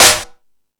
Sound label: Discoball, drum machine; E-MU Pro-Cussion-1